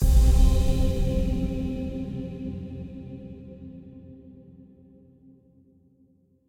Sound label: Tessellation Combo; Mixolumia